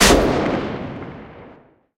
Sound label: mg 8mm l8a1 lastshot; guns; tanks; War Thunder